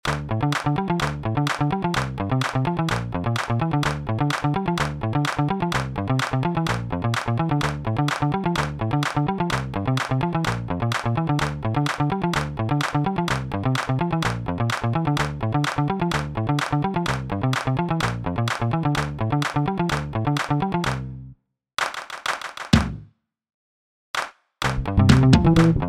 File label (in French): Love anthem rythmes sans clap